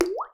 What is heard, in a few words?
Plip